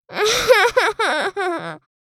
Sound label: Young girl crying desperately